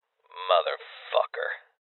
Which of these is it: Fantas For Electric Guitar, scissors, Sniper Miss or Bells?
Sniper Miss